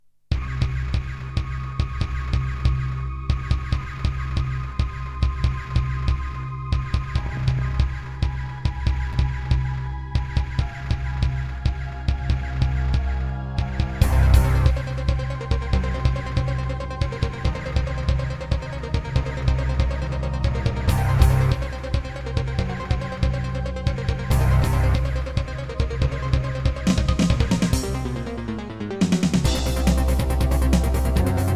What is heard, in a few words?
Casio GZ-50M